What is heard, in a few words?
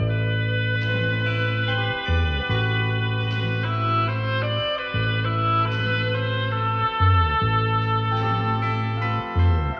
sax, sad; loops